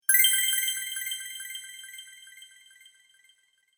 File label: Fairy Sparkle Delay, magic; fiction sound effects